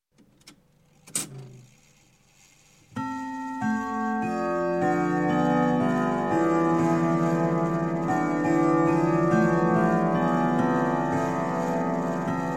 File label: Clock, Grandfather Whittington Chime - Strike; Strike Eleven; Sound Ideas 2000 Series